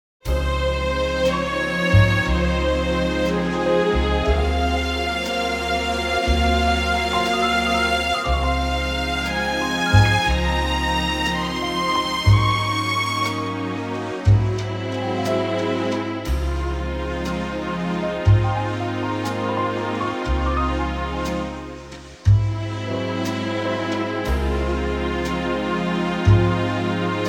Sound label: Db orch